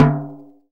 HI TOM, VINTAGE FUNK; Zero-G; Funky Elements

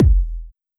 Kick, Drum Hits

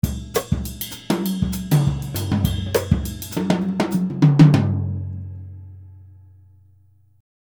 Jazzistic; Univers Sons

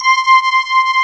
BELL BRASS, SYNTHESIZER; AKAI S6000 CD-ROM - Volume 1

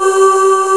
FAIRL CHR, SYNTH VOX; East West - Global Sounds

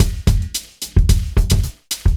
LOOP, 70's Breakbeats; USB Soundscan vol.46; AKAI

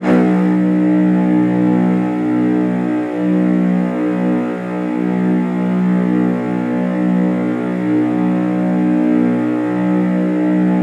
CELLOS C#2, Strings; ILIO

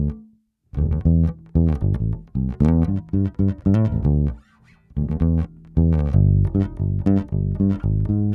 Bass, Am 115bpm; Zero-G; Nu Disco; Construction Kits; Trunk Of Funk

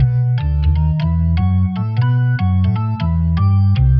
Jazz Keys Organ 120 bpm Riff B

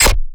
bass slice

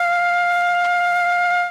flute A, FLUTES

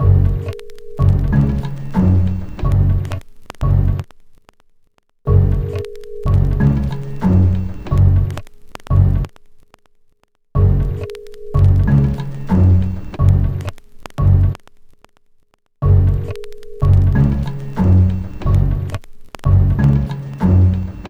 Bass, Hip-Hop

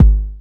ELE BD, Drums Dance & Groove; USB Soundscan vol.11; AKAI; ELEC. KIT

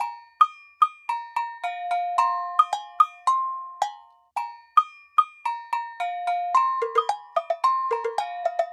Bell Ensemble, African; Sonic Foundry (Sony Creative Software); Continental Drift Aftershocks

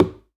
Plastic Bucket, household rhythm samples Hits

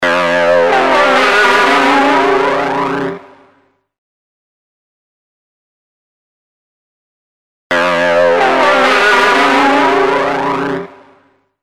guitar, industrial

